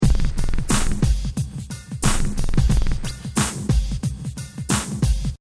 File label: Hip Hop Drum Loop 90 Bpm Swing Without Crash; Pneumatic; Royalty Free Drum Loops; LynneMusic